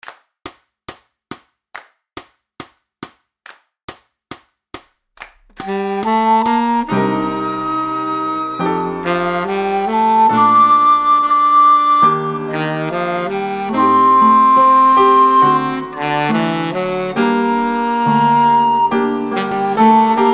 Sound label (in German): ohne Bass